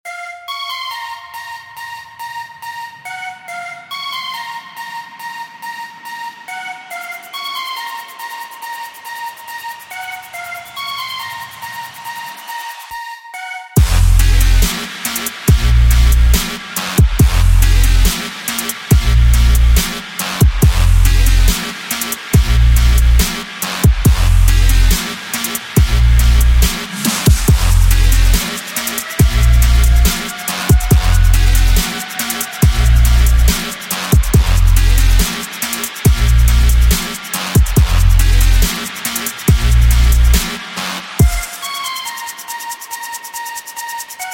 Bass Boosted

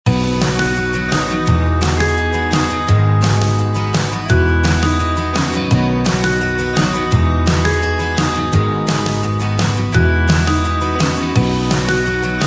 Inspiring Corporate

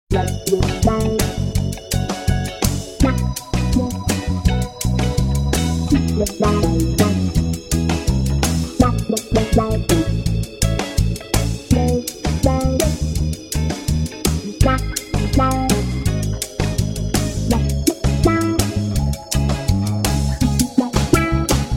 Reggae Bar; Cafe Atmosphere

